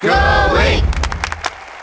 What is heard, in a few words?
Crowd Link; The crowd chanting for various characters